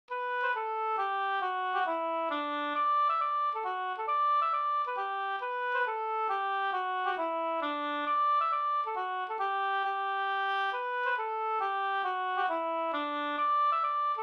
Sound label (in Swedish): mazurka; e Batsman Dristig Bh